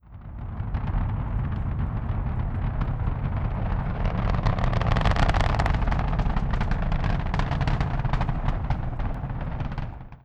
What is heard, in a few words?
shuttle launch